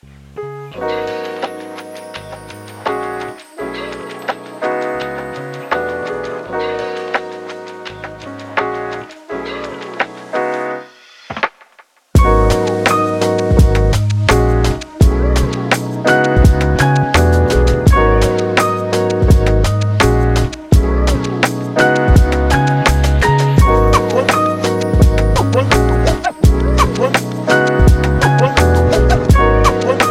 aesthetic lofi background

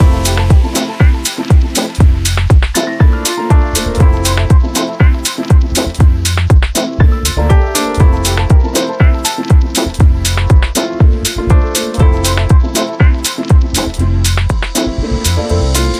street melodies

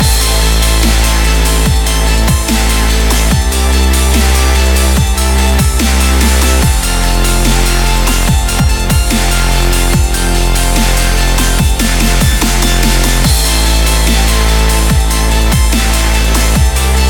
aggressive hi tech and extreme sport dubstep

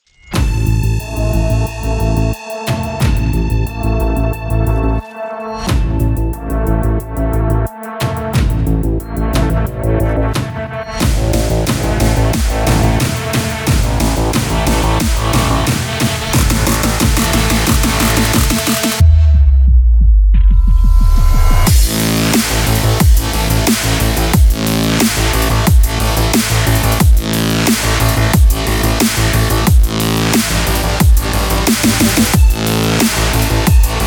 extreme action sport

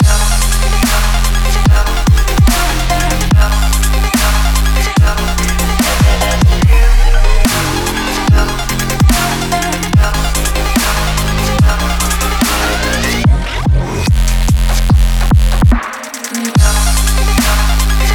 extreme sports

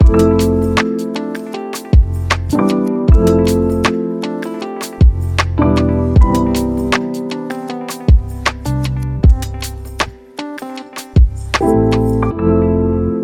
relaxing chillhop loop

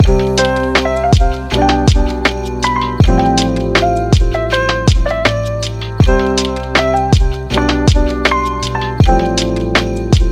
chill winter moments loop

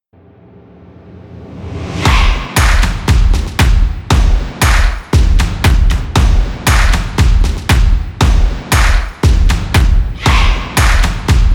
stomping extreme sport

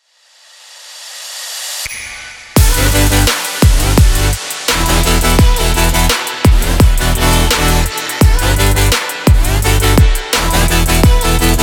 a future bass

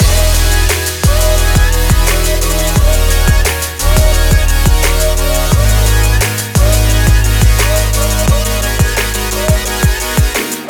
inspiring modern pop

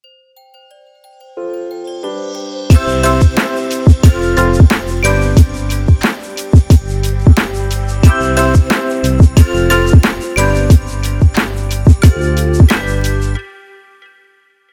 beautiful christmas

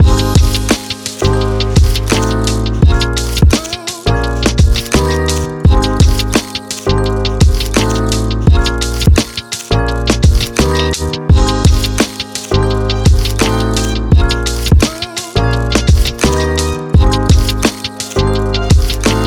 street vlog hip hop loop

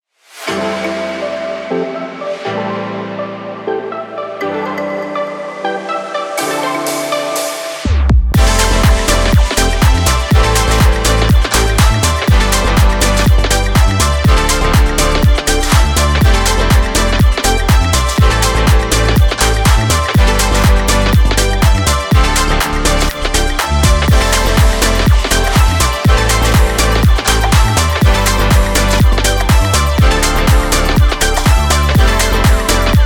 energetic background upbeat

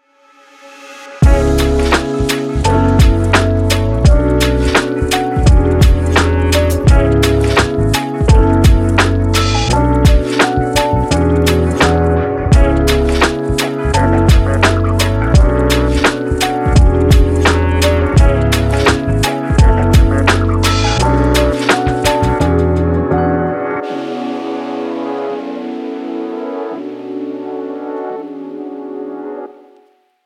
sweet vlogging

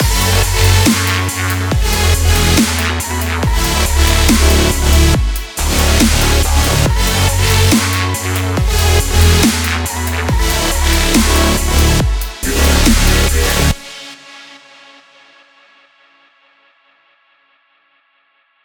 future dubstep

